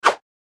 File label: slash; slice fruit